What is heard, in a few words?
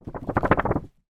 foley photo wobble